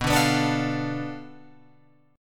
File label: B Minor 7th Flat 5th B, Guitar Open F; Bm7b5 strummed